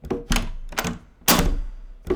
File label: Wooden Door With Metal Fittings Shut Open